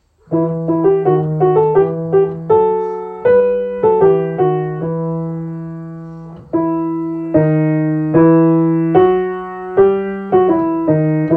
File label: Russian folk song